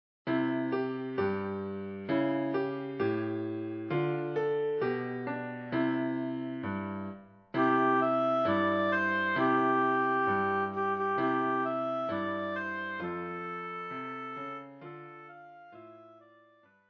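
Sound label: oboe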